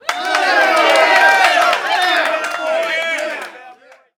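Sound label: Street Ball Crowd Cheer High; Crowds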